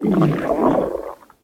gurgle

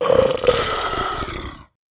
roar; greed

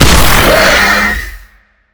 demon hit

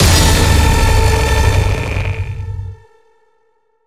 light ray fire; dogma

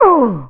filter hurt

